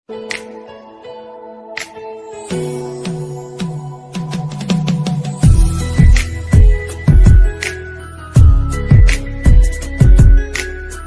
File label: instrumental remix